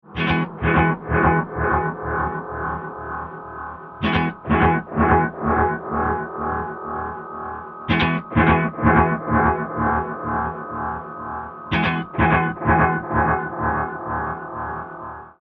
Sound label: robot rails, ghost delay; deathbyaudio